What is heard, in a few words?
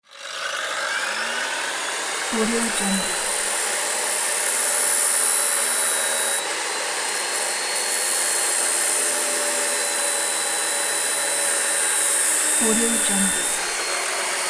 circular saw